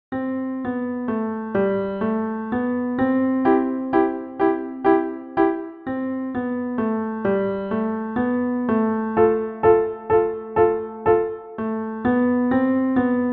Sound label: Waltz